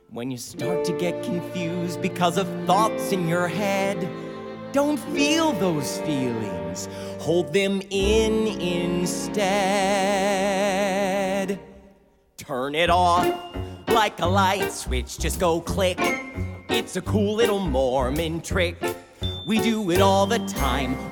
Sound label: High Register (Tenors Baritones); Iceberg lounge